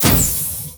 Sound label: Drop Hatch Start; Doors